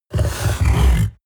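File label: coloss snort deep short, creatures; kreaturen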